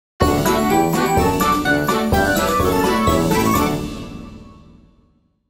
Stage Clear; G&W; Ashley

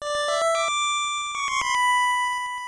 Recorder; Super Mario Bros 3

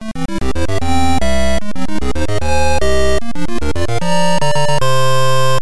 Course Clear; SMBS

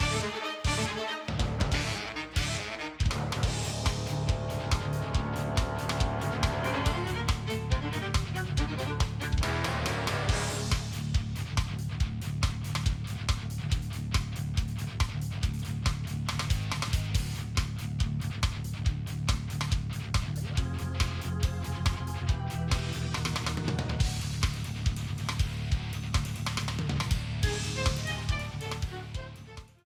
Battle Thinking; Vellumental

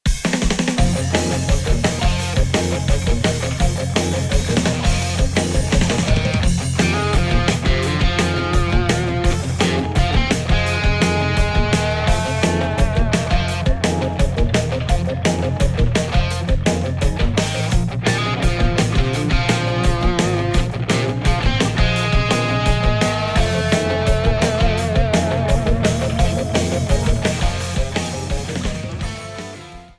Underground BGM Arrangement; SMW

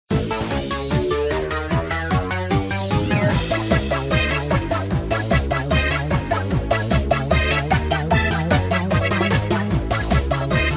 Wonder Effect Theme; SMBW

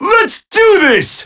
Alarm Clock; 18-Volt

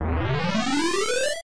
Erase Zoom In; Mario Paint

